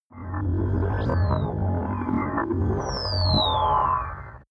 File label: drone twitter, drones